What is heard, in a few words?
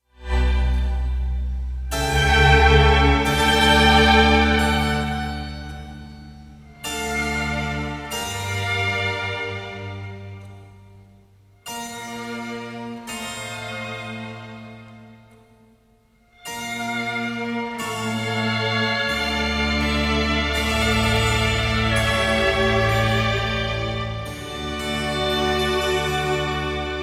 sonata for trumpet strings and basso continuo in d major; henry purcell